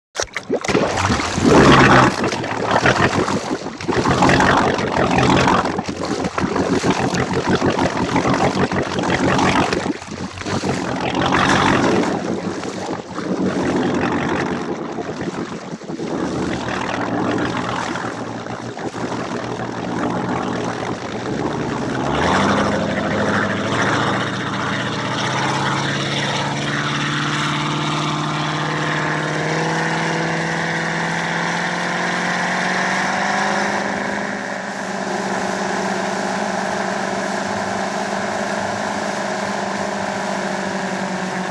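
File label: Boat Engine Start run, powerboat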